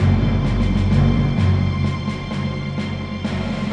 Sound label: Drum loop short; The Official Guide to Babylon 5; Weapons